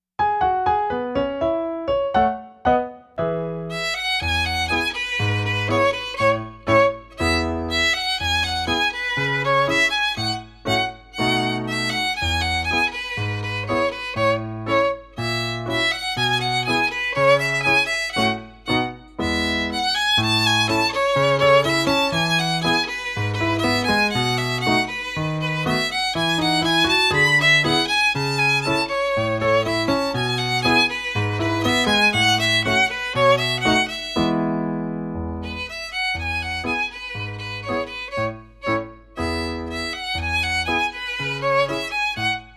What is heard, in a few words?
Ragtime, violin